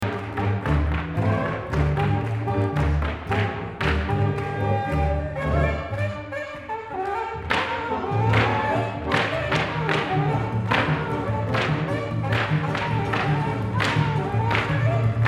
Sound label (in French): Musique Live